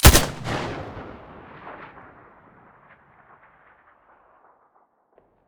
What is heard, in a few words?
scar muzzle stereo